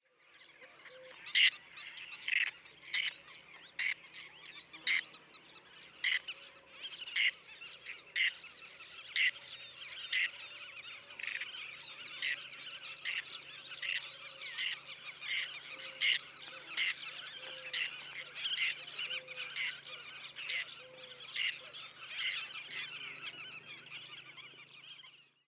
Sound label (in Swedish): Skäggtärna